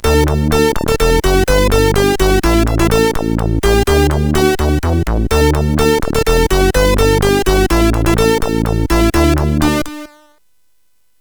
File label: Sinister 5 intro, sid CSG8580R5; hvsc